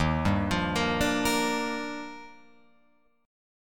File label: Eb Major 9th, Guitar Open F; EbM9 arpeggiated; 3,2,5,5,5,5; JGuitar